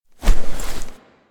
Foley Look At Figurine Start; Ambience; Quest Drifter; Duviri; Warframe

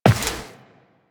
footsteps walk; Warframe; Tenno

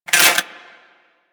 Mech, Weapons, Rocket Crossbow; Warframe; Archwing; Arch Prisma Fluctus

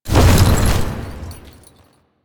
Horse Glide Wings Retract; Vehicles; Duviri

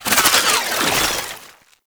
Infested Spore Pistol Mech React; Weapons

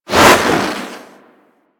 Jet Polearm Spin Whoosh, weapons; Grineer

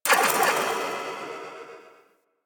Spring Bounce; Ambience; Warframe; Corpus Railjack

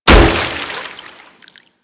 Staff Impact Water

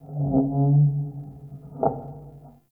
Cave Ocean Dock Creak; Ambience; Midrath Island

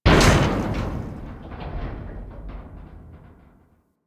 Ship Hull Impact Small; Hit Effects; Warframe; Railjack Hull Hit Effects

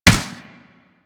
Space Ship Turret Fire; Warframe; Grineer Pursuit Space Ship

